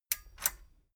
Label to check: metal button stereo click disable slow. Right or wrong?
Right